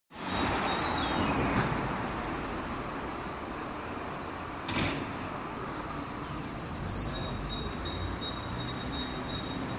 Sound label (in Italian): cinciallegra